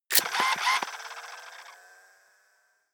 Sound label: turn on off